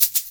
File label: shaker; InVision Interactive; GRUNT